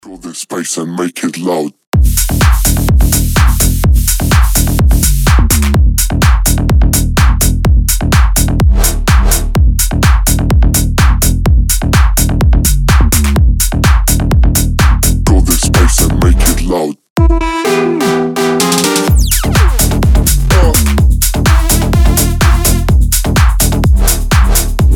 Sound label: oriental party